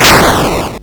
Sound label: Arcade Riffs